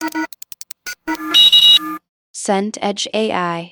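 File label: robot beep sequence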